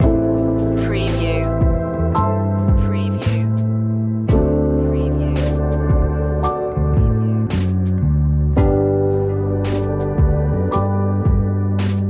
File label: peaceful mind loop